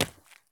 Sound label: sprint asphalt; Escape from Tarkov